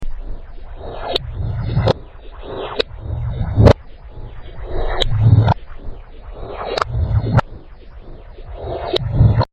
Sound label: ping ring